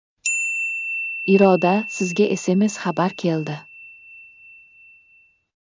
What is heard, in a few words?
sms